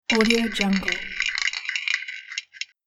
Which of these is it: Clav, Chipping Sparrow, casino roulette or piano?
casino roulette